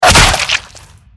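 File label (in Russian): попадание в голову; Убили игрока Counter-Strike попаданием в голову; cs go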